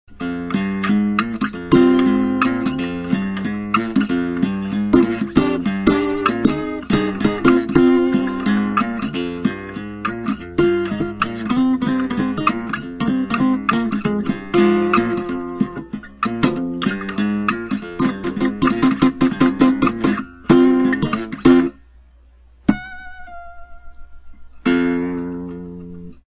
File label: basslab silver tap mic unplugged